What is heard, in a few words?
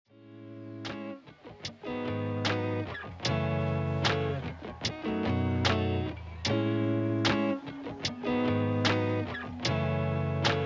Calm, Lo-fi